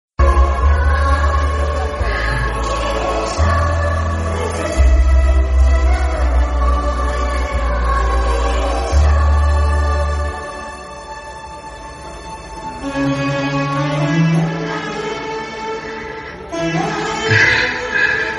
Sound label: climax emotional bgm; radhe shyam